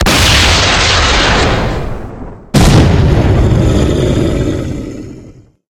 giant death scene; STALZONE; minecraft

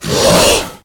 bloodsucker attack hit; STALZONE; customnpcs; stalker

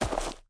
gravel, step; STALZONE; minecraft

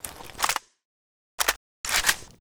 9a91 reload; STALZONE

